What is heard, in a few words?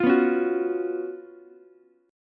Gb Major 7th Suspended 4th Gb Ukulele; GbM7sus4; strummed; JGuitar